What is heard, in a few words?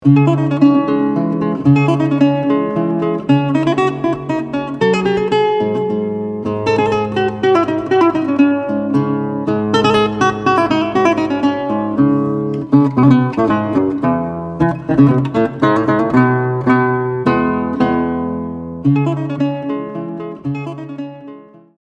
Minuet, barroca